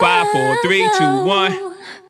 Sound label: 5 4 3 2 1 singing; numbers and letters